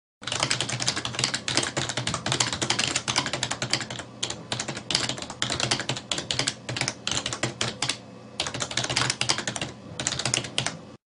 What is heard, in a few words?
typing